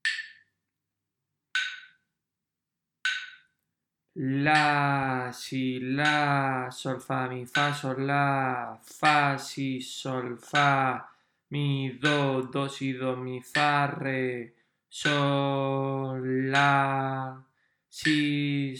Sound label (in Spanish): RITMO